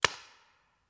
Paintball Gun; Sound Ideas; Guns

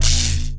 Scifi Forcefield Activate; Energy & Forcefields

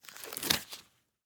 Latex Glove; Blastwave

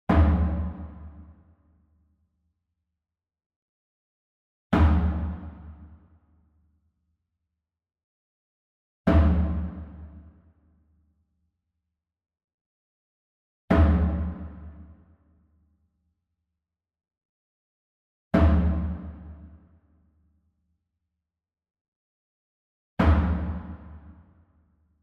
SMALL DRUM Fontonfrom Hit Hard Beater Far; boom library; Cinematic Strikes Construction Kit

